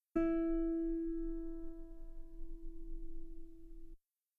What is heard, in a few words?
guitar E4 very long piano normal; bananenkeyboard